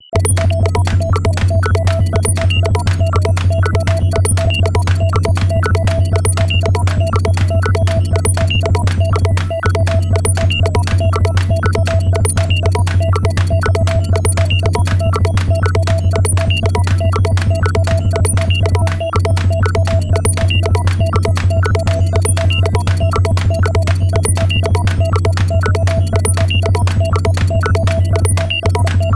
Electric Pudding; lsd revamped

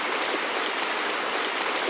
Water Loop

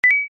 Sound Point; Dream Tower

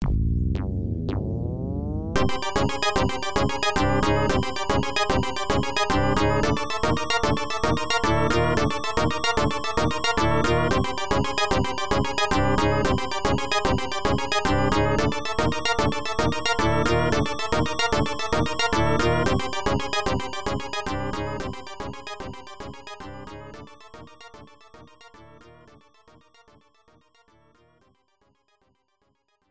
Earthquake; Corpse Party

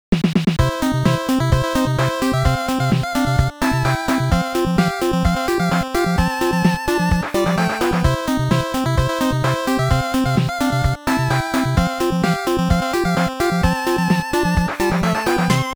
Opponent Music

